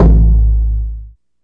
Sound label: low drum; Kicks; single hits